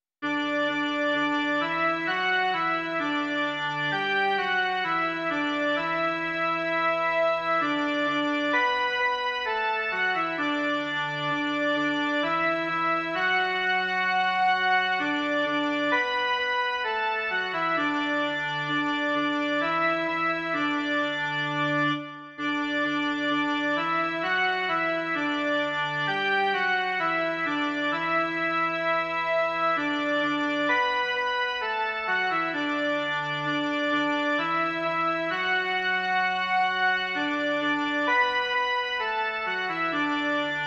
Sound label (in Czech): jen melodie; Tobě, Pane, patří dík